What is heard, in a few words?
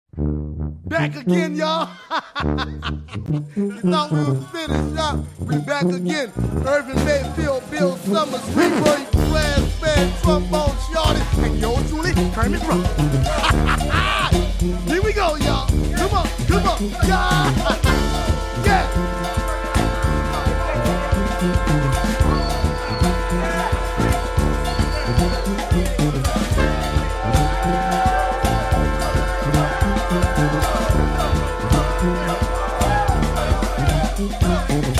Brass Timbre test